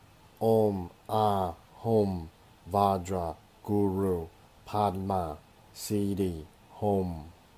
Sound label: Vajra Guru Sanskrit Mantra